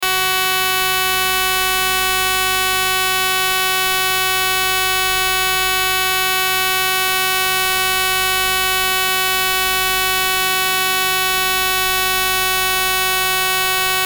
CRAFTsynth 2.0 USB Noise

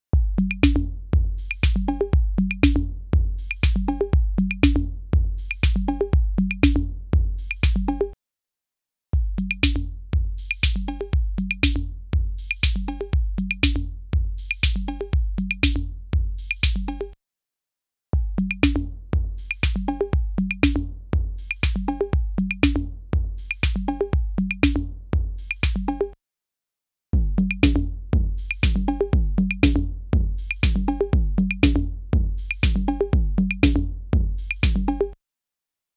PRE Drumloop; ARTURIA

